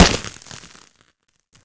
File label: concrete, impact bullets; hl2